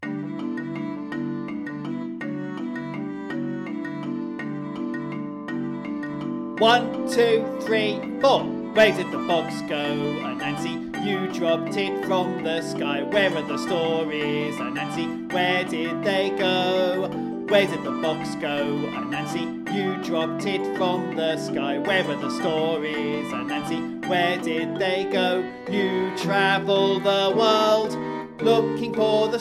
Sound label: Anansi song with voice; Teachers' guide tracks